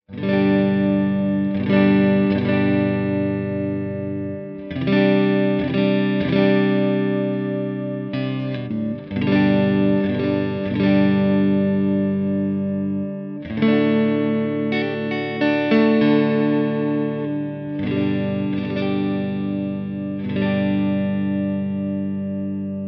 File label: Steel Pole Neck Clean; Revel; Mojo